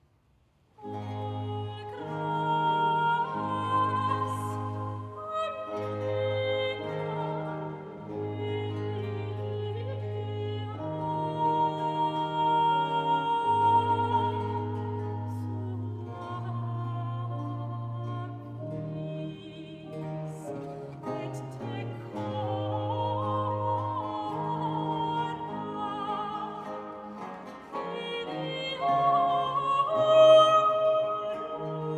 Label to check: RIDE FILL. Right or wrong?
Wrong